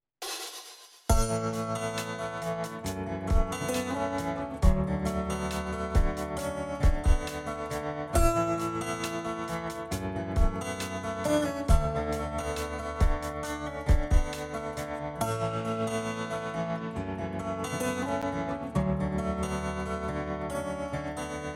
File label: Ambient Aura